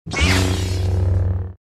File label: Saber Hit